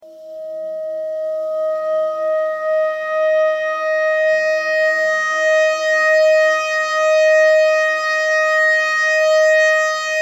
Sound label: clarinet notes e clarinet notes e thread simple